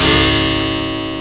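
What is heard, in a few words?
bell clock; ALARMS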